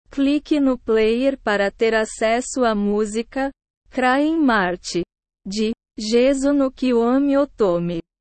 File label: crying march